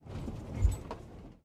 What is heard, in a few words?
soft road bump; Blind Drive